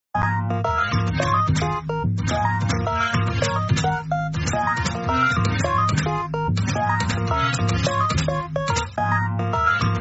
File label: game music